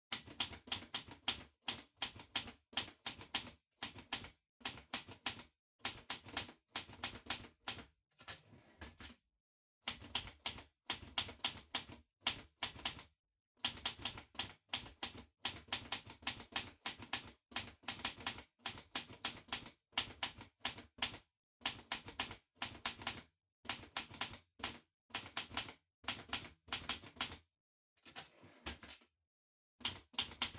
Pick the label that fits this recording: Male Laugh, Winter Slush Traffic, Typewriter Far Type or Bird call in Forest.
Typewriter Far Type